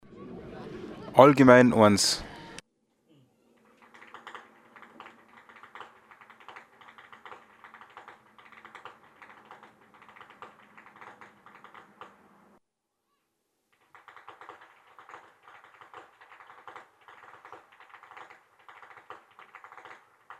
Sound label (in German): schnalzen; sillersdorf; saaldorf